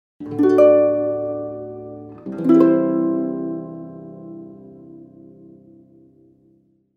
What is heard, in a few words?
harp chords